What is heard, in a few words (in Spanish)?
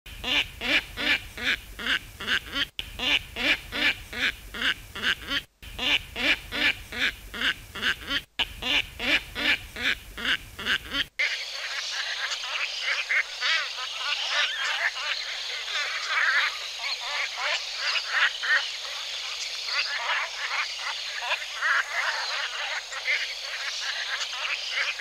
cuervillo de canada cantando